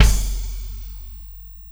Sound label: Combo Shard, Processed Drumkits